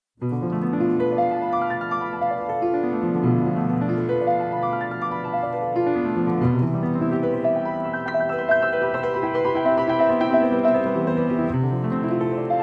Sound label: Lament, Prelude, Fugue